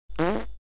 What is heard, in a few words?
Raspberry short fart